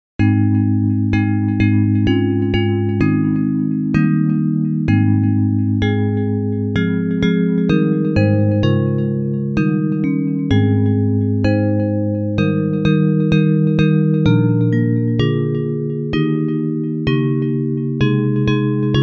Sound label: Bells